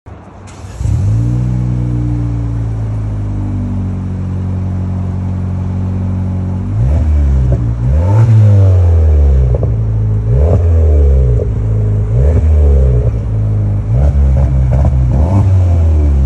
Chery Arrizo; ماشین

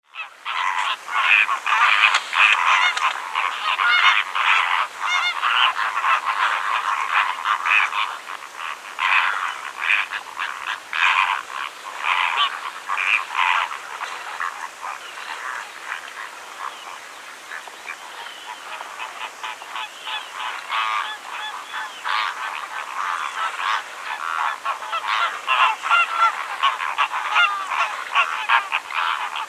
greater flamingo calls greece

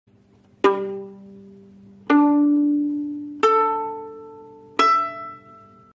Violin notes; نت های ویولن

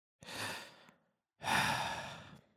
JOLTED; از خواب پریدن

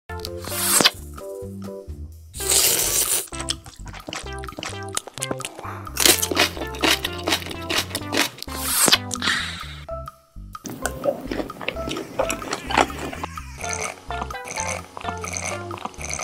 eat soup

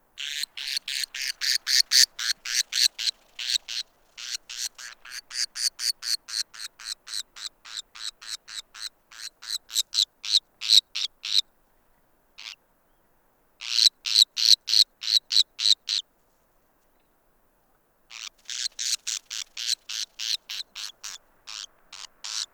White bellied cinclodes; پرنده آب جوی شکم سفید

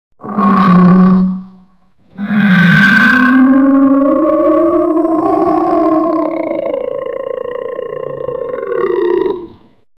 Troll Monster; هیولا ترول

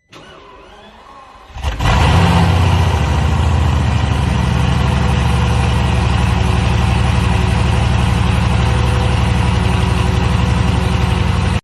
Bugatti Veyron

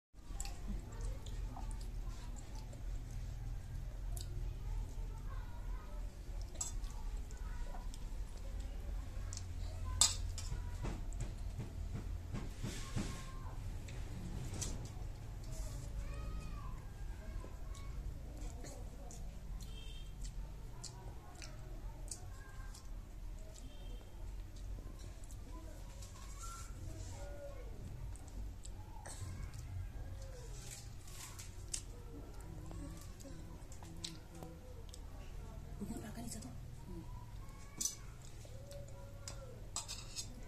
baby eating

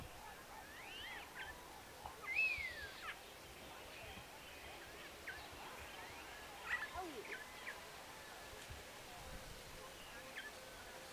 Neumann's starling

